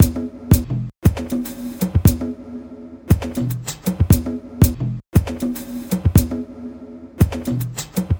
Racecar Drums

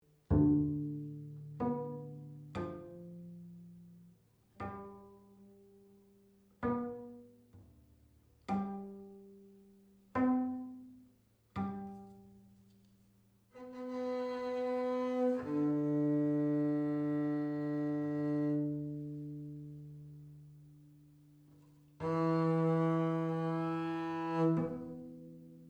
Prepared Double Bass

